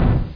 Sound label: impact